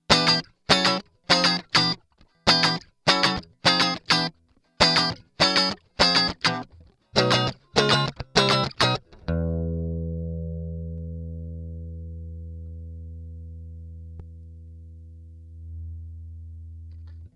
Channel LPP 73EQ JR Mid Boost; Heritage Audio

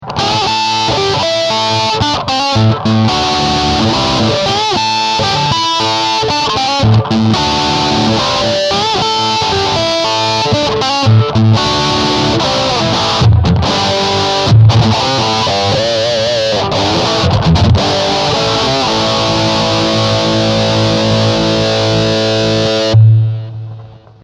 Picking; FabTone

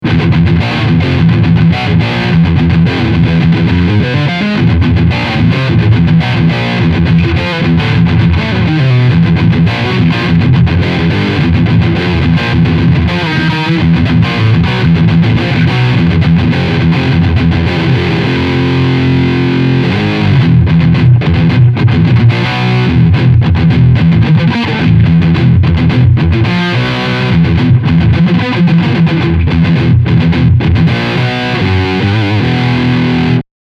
Riff Mid Scoop

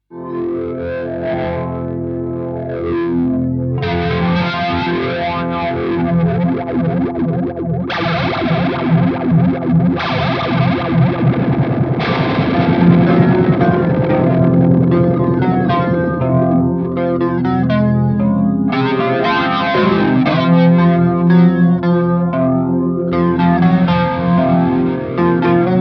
Wampler Terraform Flanger

